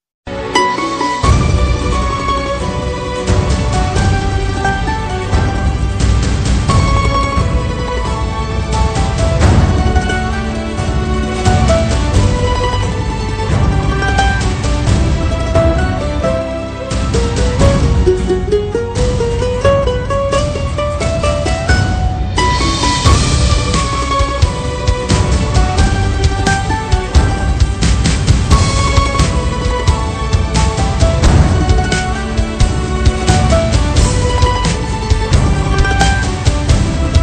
romantic ringtone, heart touching ringtone; dilwale movie; shahrukh khan

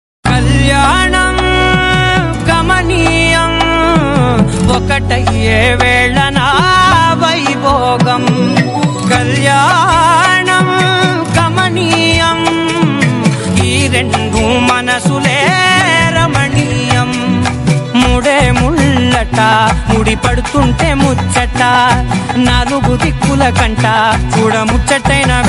ringtone bgm telugu; pushpaka vimaanam

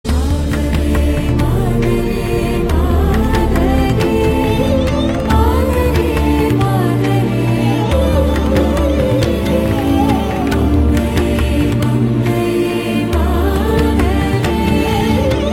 Bgm; Bigil

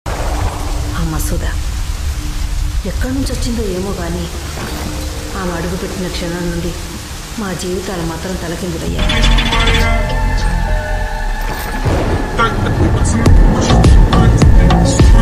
mass entry attitude bgm; masooda

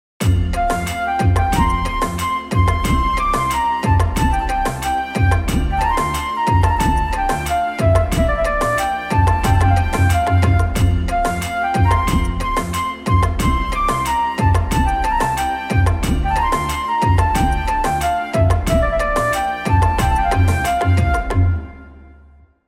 dhun instrumental ringtone; tabla piano

